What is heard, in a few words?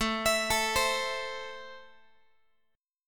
A Minor A down Guitar; x,17,19,19,16,x; JGuitar